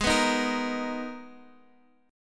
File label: G sharp Minor Add 9th G sharp; madd9:1,b3,(5),9; x,11,9,8,11,x